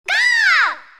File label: Goal